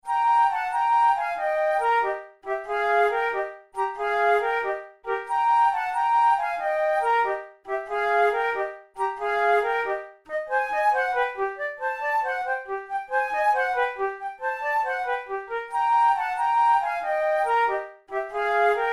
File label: methode de flute; tulou